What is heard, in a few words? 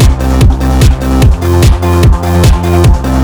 Music Loops Mini Game